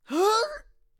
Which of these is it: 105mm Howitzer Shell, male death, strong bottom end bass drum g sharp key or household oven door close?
male death